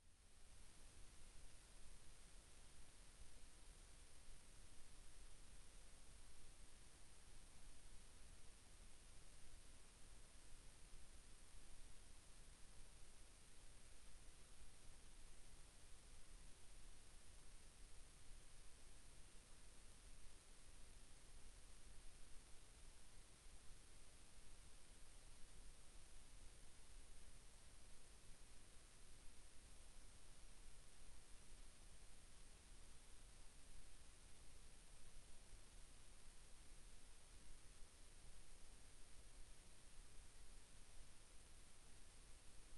car ford fusion petrol

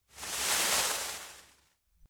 rustling large; weather and nature

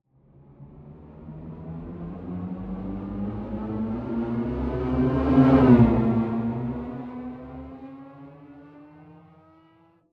abstract spaceship flyby ascending; sonniss gdc

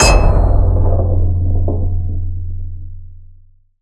cinematic metal anvil impact; bluezone; sonniss gdc

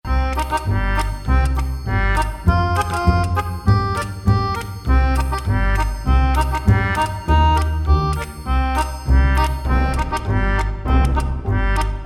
loop; plankers cove; ivan duch music packs

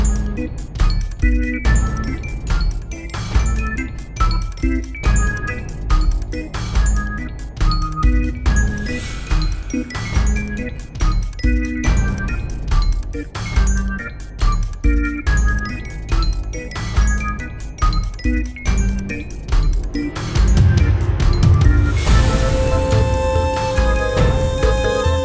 Witch Carnival Loop; Carnival Path

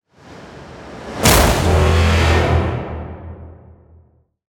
transition whoosh hit; sonniss gdc

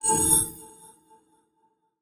Reactor Warning; lancer weapon fx

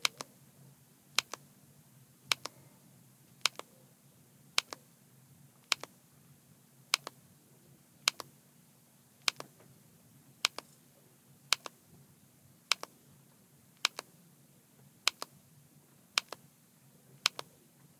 flip phone keypad 0 zero; fvtt sonniss gdc audio

